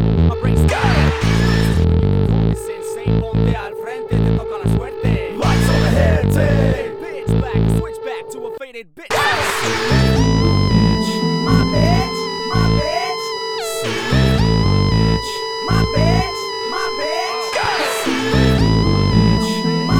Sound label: harmonic